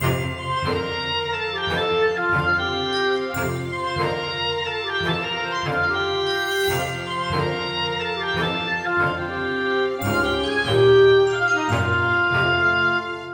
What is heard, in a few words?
post trainer battle music; EvoCreo; Android game music